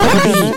retro damage hurt ouch

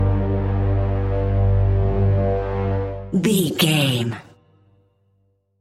modern horror stinger

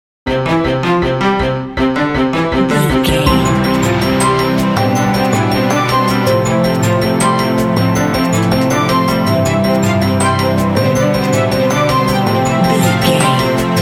perpetual symetric motion bumper

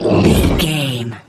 troll monster effort action release

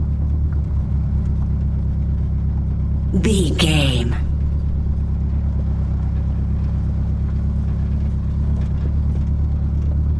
truck low engine rattle loop

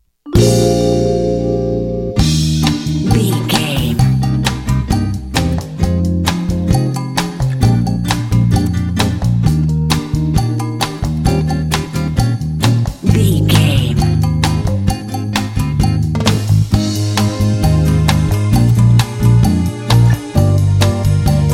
quirky cha cha comedy

